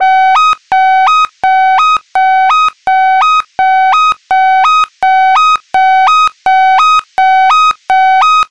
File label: electronic alert siren